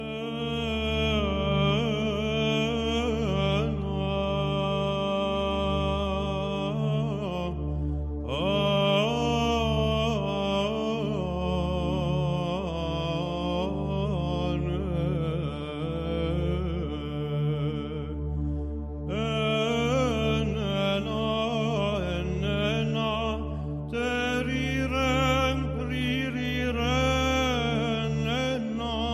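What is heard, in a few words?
Chant of Athos monk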